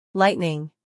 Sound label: lightning